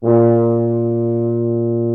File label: Orchestral Brass